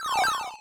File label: PSI Rockin; mother2